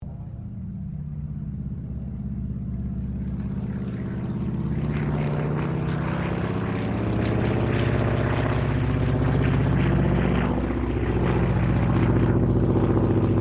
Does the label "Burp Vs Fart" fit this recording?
No